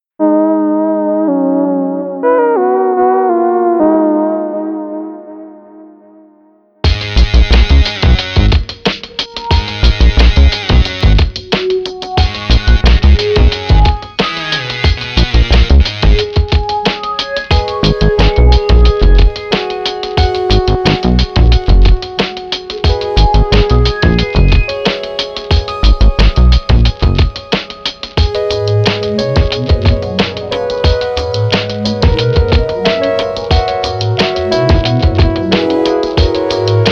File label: panic beat